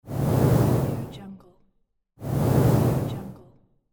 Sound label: swoosh stereo